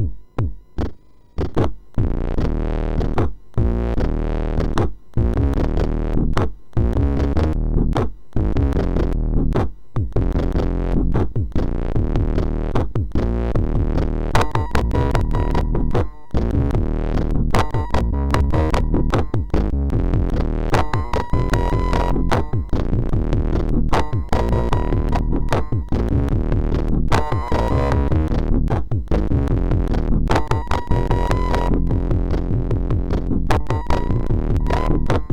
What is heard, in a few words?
Electro, sid MOS6581R4